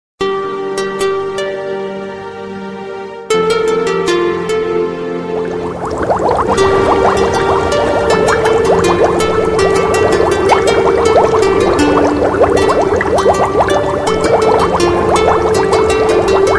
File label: Water Mix, Ringtones; Airtel